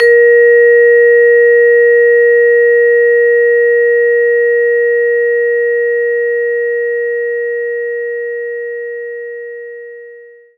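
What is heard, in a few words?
Vibraphone A#2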